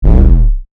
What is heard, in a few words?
Synth, Square, Wave, Blip; Stabs; BlastWave